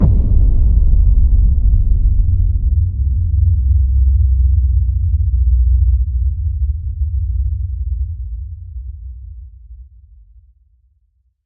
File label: Hit Low Rap